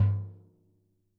XKICKS, Living Drums; Sampleheads; Peter Erskine Living Drums

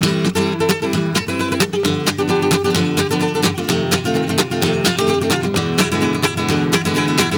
GUIT, Gipsy; Best Service; Ethnic Journey Sound Cube

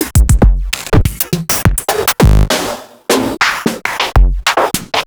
Drum FX

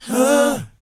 BOYZ B, Vocal Planet R&B & Dance; ILIO

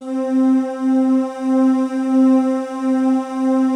PAD, DIGI-PAD; Best Service; XXL 800 Pads; Sample CDs

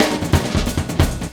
LOOP SD, 180 BPM; Ueberschall; Drum & Bass Flash Trackz

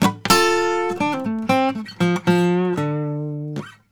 Blues Guitar Samples; ILIO; Fretworks; LICK

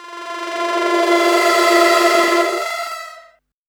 rise, fx & sweeps; EZRollers

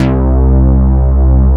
BIG MKS BASS, ROLAND MKS-80; Rubber Chicken Software Sample Bank

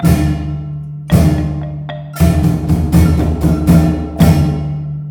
MALAY R, Heart of Asia

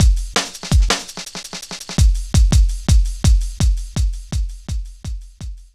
Drum Loops, Jungle, 166.5

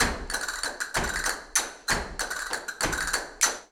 Castanets, Flamenco

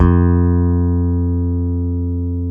P BASS F3, pick bass

